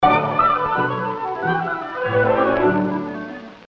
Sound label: playful 50's music